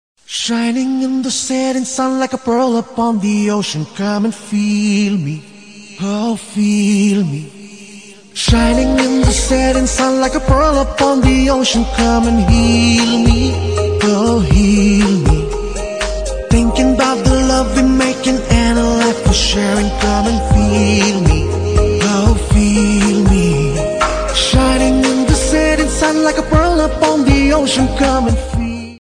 ring tone ringtone

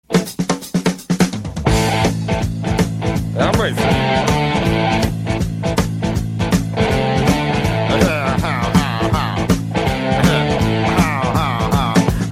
rock ringtone

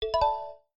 cheer ringtone, Nokia SMS ringtones

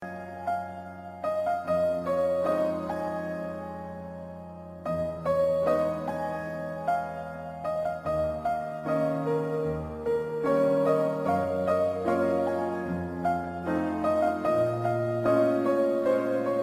sad piano ringtone

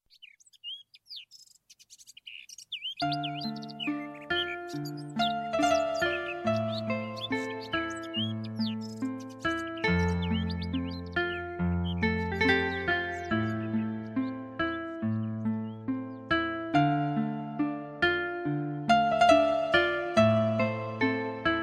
lovely tone ringtone

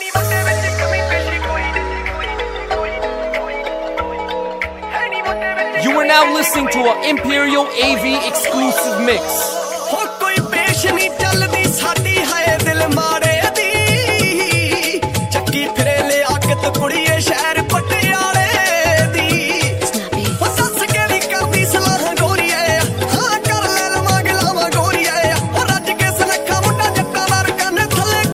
remix ringtone

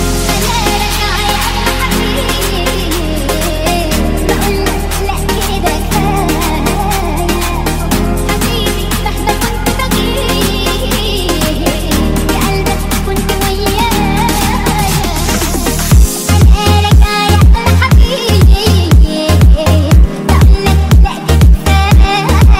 new arabic remix ringtone

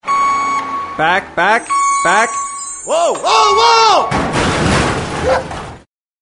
back back whoa hit ringtone

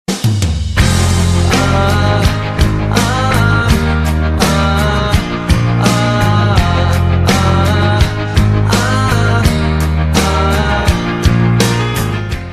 ringtone

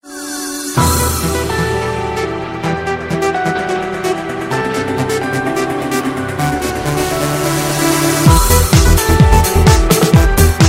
piano ringtone

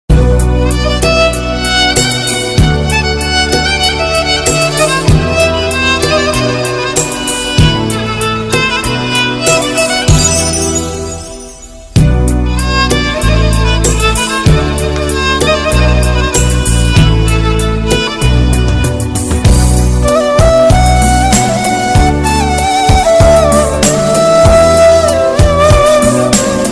arabic violin flute ringtone

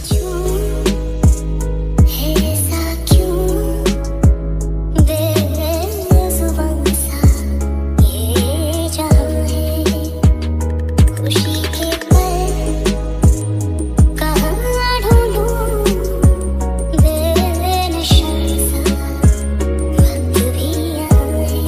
sad status ringtone, bollywood ringtones; whatsapp

